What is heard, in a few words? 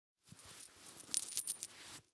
Coat Reach In Pocket Drop Change; Foley